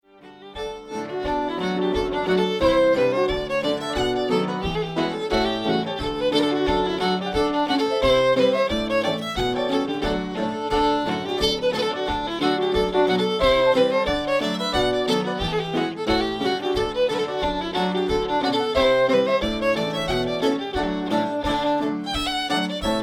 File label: Hornpipe; Wicklow Hornpipe